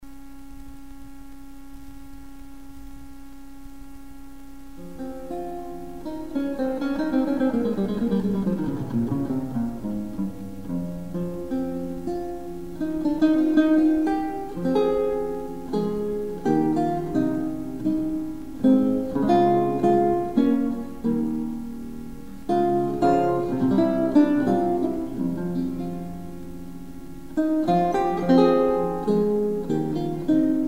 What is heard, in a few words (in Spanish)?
vihuela; La ilustre fregona